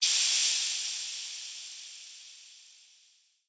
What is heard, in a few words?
gas